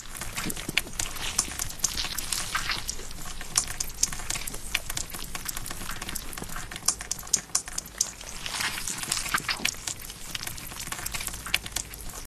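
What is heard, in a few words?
Blood Drip Loop; silent hill homecoming 2008 gamerip pc ps3 x360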